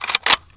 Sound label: svt40 reload bolt back, weapons; sven; ww2projekt